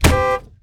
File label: hit wheel